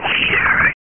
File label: Amiga Attack Couatl Sound; Dungeon Master and Chaos Strikes Back